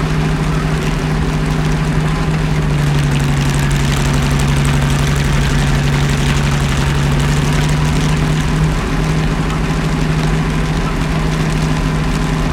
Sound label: panther engine rpm; War Thunder